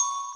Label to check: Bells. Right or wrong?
Right